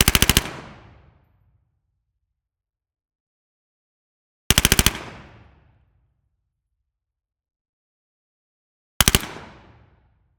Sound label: MP5 9mm - FIRING - Large Hall - Bursts - 1m Side; MKH8060; Pole Position; The Indoor Gun Acoustics 2 Library